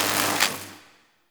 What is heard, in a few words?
Elec Spark; Warframe; Nokko Colony; Ambience